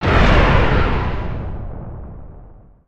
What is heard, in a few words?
distant blast off; nimbus station